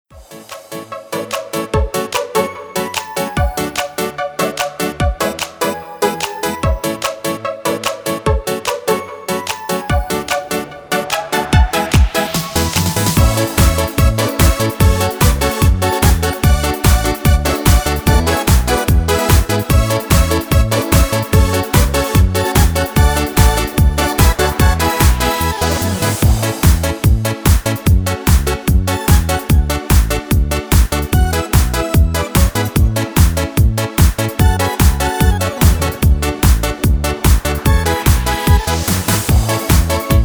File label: Disco Polo